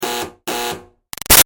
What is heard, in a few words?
Buzzer Door Junky Double Short; Alarms; Electronics; Big Room Sound